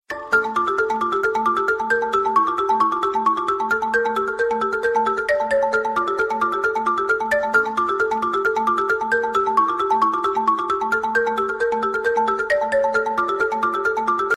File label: xylophone ring tone iphone 6s ringtone